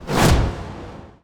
Whoosh Hit Annihilation; Whooshes; Ghosthack

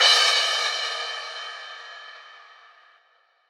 Fat Crash, Cymbals; House; Drum One Shots; Cymatics

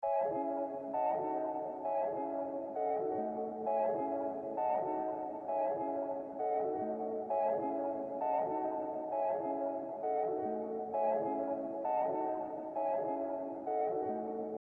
132 BPM C# Min Pad; Trap; Loop Stems; Glock

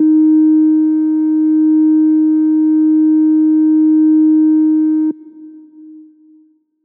Riddim Melodic Hit - D# Quiet; One-Shots; Ghosthack; Riddim Essentials Volume 2

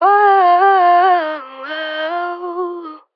Ad Lib Dry - F Min; Vocals; Cymatics - Eternity Sample Pack; Ad Libs

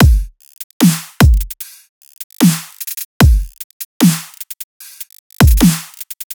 Dubstep Drum Loop Full, 150bpm; Full Drum Loops (Stem Seperated); Ghosthack; Dubstep Sound Arsenal

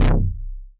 BASS Hidden (C), Melodic One Shots; Cymatics; ANALOG EVOLUTION Beta

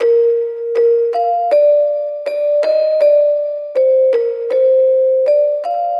Nostalgic Melody Loop 160 BPM A# Maj Plucks; Loop Stems; Cymatics; 2020 Melody Collection

